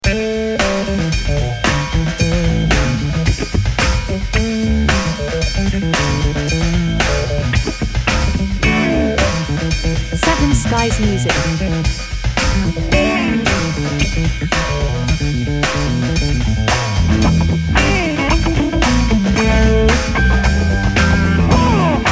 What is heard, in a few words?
Girl Groove